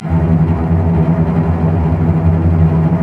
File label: STR SEC; Roland - LA Composer vol. 1